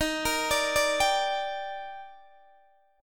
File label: D sharp Major 7th, Guitar DADGAD